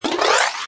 Cartoon Change, Rattle up, scoop or magic trick.; Comedy/Cartoon; Funny Sounds; LynneMusic

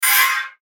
METALLIC FAST, Metallic Fast Attack; Metallic Fast Attack Soft Reverb; Production Element Imaging Element Accent Transition; Metallic Sound; Metallic Sound Sound Effects

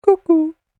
Cuckoo Animal Impersonation; Bird Sound Effects

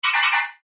Chime Multimedia; Web Interactive; Technology; Chimes; Blastwave

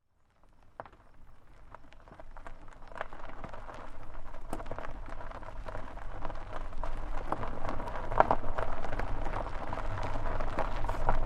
Car Tire Onboard Gravel Dirt Roll Slow Short; Cars

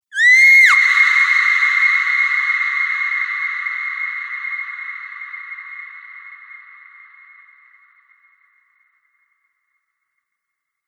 Scream Cathedral Drone Collection; Screaming Sound Effects

